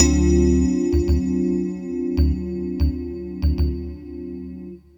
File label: Electric Piano